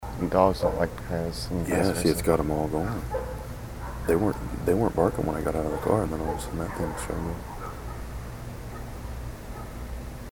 Dogs hate coyotes

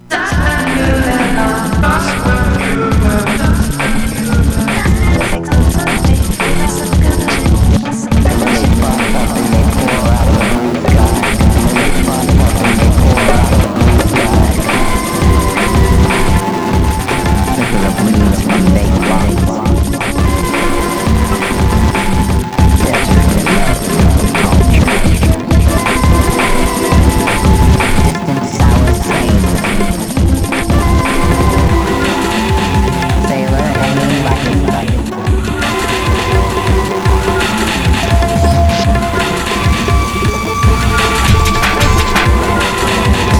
Chortle

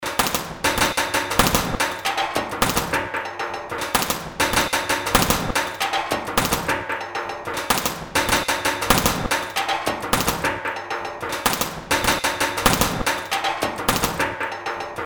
drums; Chunnel

